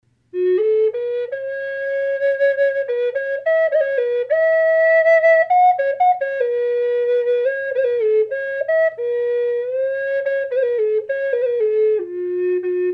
redwood burl, flute; collector flutes

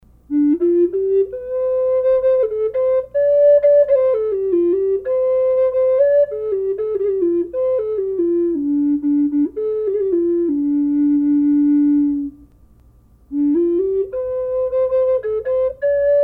andes condor d flute; collector flutes

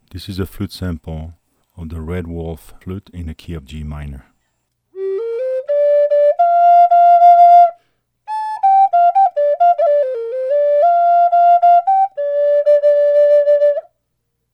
redwolf flute dry

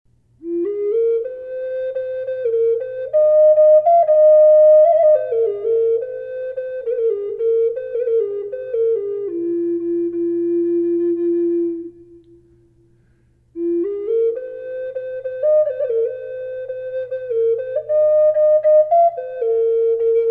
raven f minor flute reverb

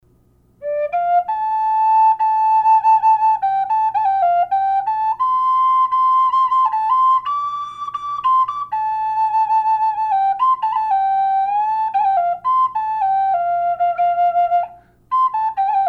high D, flute; collector flutes

